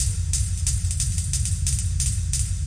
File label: 180 HARDCORE microscopic, drum loops and breaks; 161 to 180 bpm